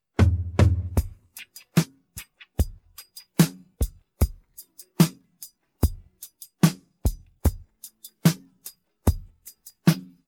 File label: drums